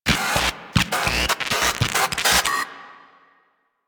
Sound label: SFX Unplug Distortion; Warframe; Orbiter Nursery